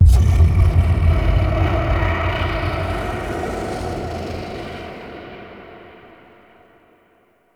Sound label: Transmission Start; Warframe; Entrati Labs; Evil Twin